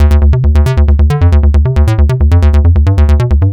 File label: Synth Bass; Basses